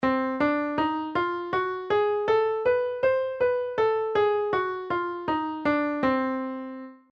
diminished scale, piano scales

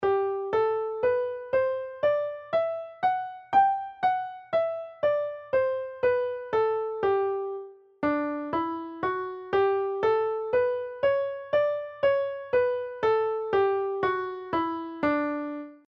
G major D major

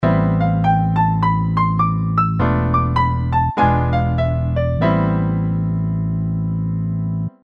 E Phrygian impro

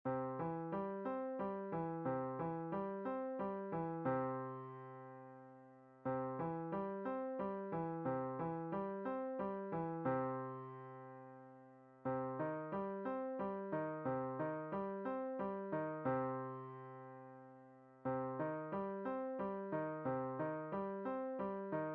Arpeggios Exercise

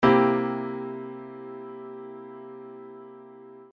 shell voicings 1st

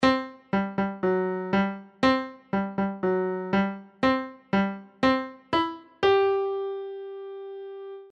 accent marks; march militaire

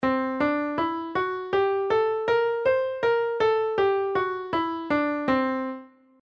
C lydian dominant scale

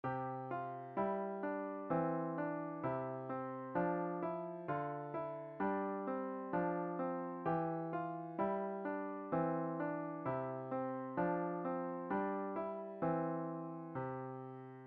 Coordination, piano exercises

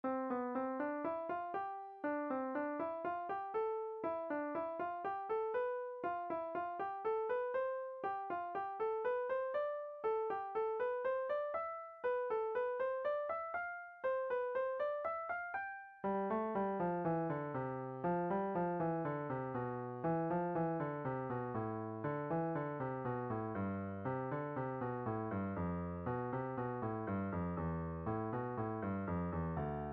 Crossing 2 over 1, piano

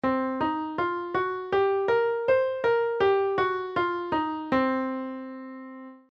blues scale

